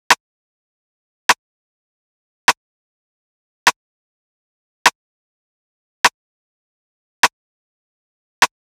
Drum Loop 101 BPM Rim; Loop Stems; Cymatics; Vibes II Drum Loop Collection; Windy

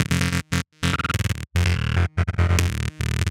Grid Loop F 145 BPM, Psytrance; Grid Loops; Neurotic

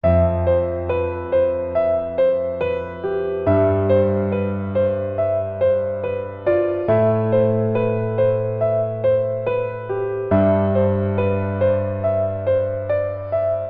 Piano Loop - 140 BPM A Min; Piano Loops; Future Bass; Instruments; Moonlight

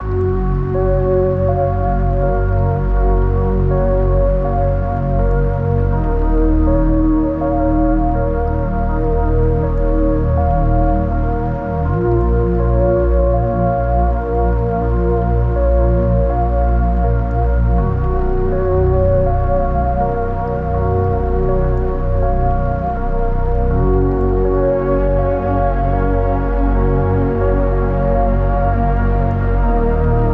81 BPM A# Min, Ambient; Melody Loops; Distance; Cymatics; ANALOG EVOLUTION Beta; Ambient Collection

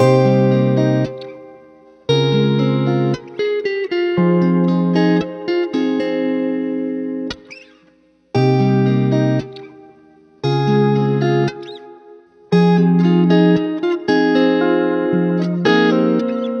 115 BPM F Min, Guitar Loops; Solar; Cymatics; Journey Guitar Loops

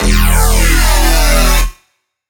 Bass Hits, Dubstep; Vice2; Advent Calendar 2017 Day 21 - DubstepBass

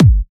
Kicks

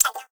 Perc, Psytrance; Hats & Percs

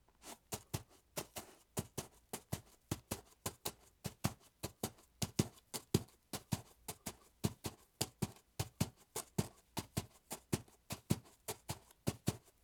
Horse hooves soft dirt; Fairlight Sound Lib

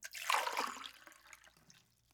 Pouring Water; Fairlight Sound Lib

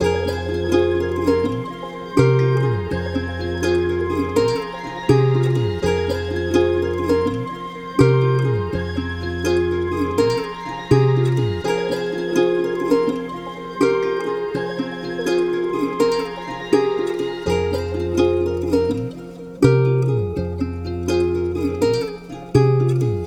Fall 165 BPM F Min; Melodies; Cymatics - Immortal Production Suite Beta

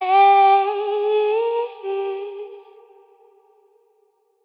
Ad Lib Wet C Min, Vocals; Cymatics - Eternity Sample Pack; Ad Libs

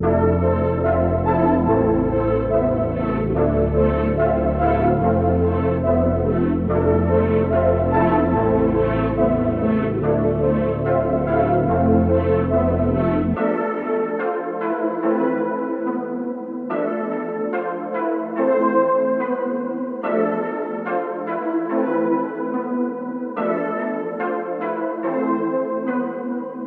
144 BPM A Min, trap melodies; Cymatics; Trap Starter Pack; Betrayed

